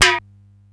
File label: SDS9 RM, Simmons SDS-9, Rimshots